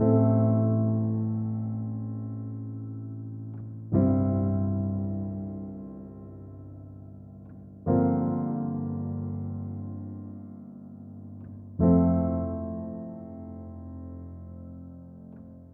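122 BPM Piano Chord Progressions Cinematic Drama F major D minor Tonic A; MMB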